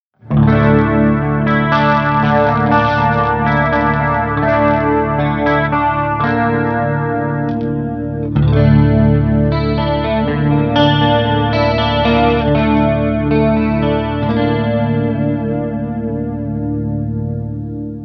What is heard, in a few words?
clean, hellfire